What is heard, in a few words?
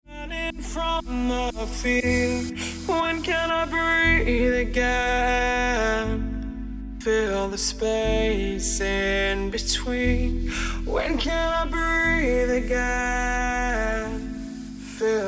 Remix Ringtone